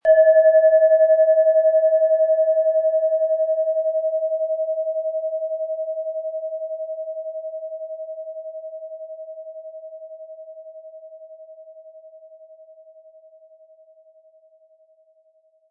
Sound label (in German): antike Wasserstoffgamma Schale; 1200-1300 gr; ruhe und meditation